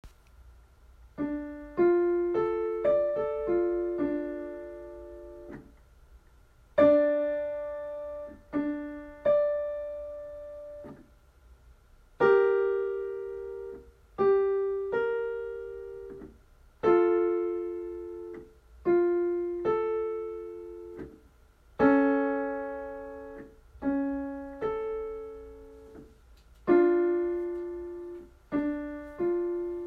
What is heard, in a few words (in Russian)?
Интервалы ре минор